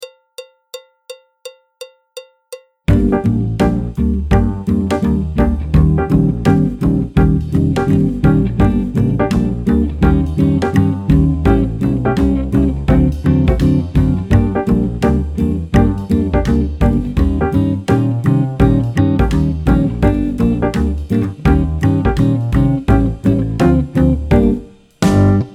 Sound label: alto, trombone, piano; Jazz Syllabus Level 4